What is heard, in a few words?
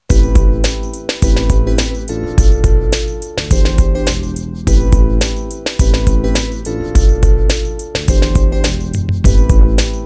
loop